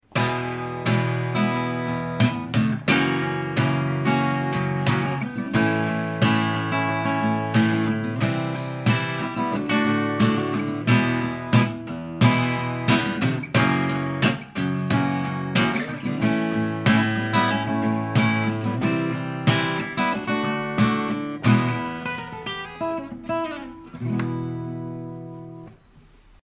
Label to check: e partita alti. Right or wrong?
Wrong